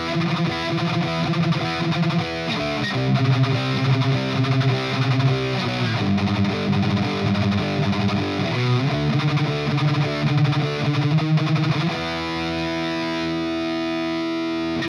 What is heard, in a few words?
EMG89 BOOST, guitar; EMG89 LEED BOOST; EMG89レビュー導入